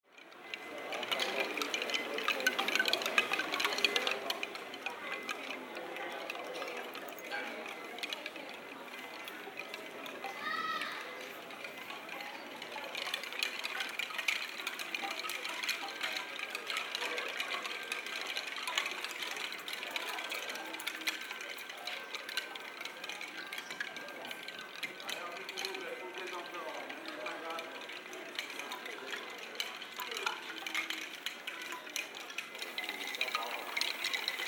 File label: shifen take katakata